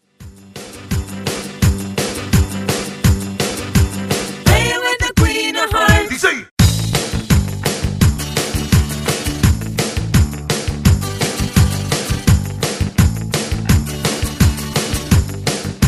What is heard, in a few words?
80s Country Rock Redrum